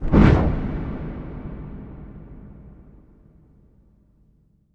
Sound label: Impact Wipe, Cinematic